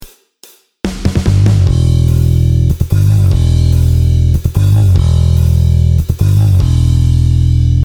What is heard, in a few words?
BASS Slide; MODO BASS